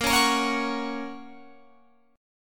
Bb Minor Sharp 5th, down, Guitar, Open F; strummed; JGuitar

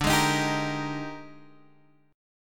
A sharp 13th

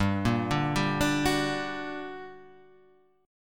G-Minor Major 7th, GmM7 chord; arpeggiated; JGuitar

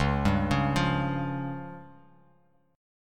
Db Major Flat 5th, Guitar Open F; arpeggiated; chord shape; 1,2,1,0,x,x

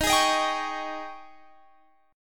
G sharp Major 7th Suspended 4th, D sharp

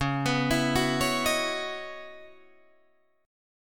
C sharp Minor 11th C sharp, Guitar Drop D

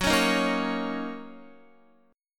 Fsharp 6th down Guitar; F#6; strummed

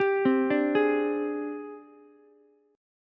G sharp Major 7th Flat 5th Ukulele; JGuitar

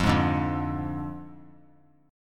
F Minor 6th F down; Fm6; strummed; JGuitar

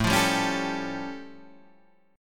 A Augmented 7th A, chord; A+7 Chord {5 8 5 6 8 5} strummed

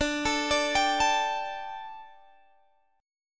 D Suspended 4th, Guitar, Half Step Down

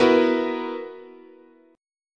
B Major 7th Suspended 4th, chord, guitar

